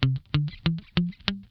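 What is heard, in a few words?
damped SCALE C1, Funk Guitar; 90 s Sample CDs; Zero G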